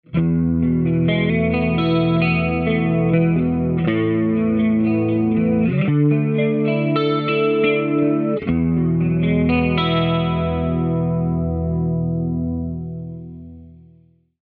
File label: GT-10 Clean Chorus